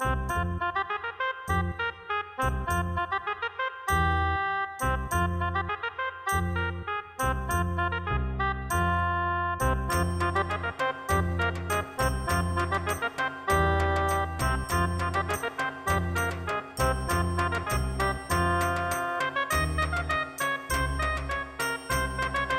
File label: Tavern; Azural Kane's UO Juke Box